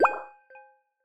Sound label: conveyor item centred